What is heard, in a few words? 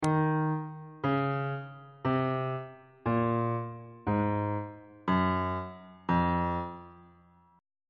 e sharp phrygian mode descending on bass clef